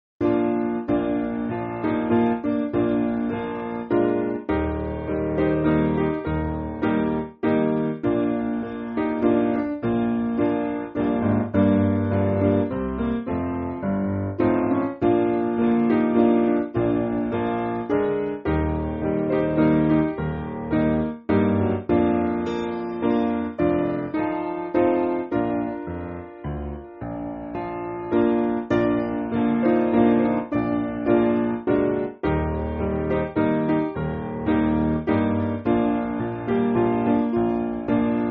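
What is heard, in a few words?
Piano, Music Backing Files for Churches; O Spread The Tidings; Comforter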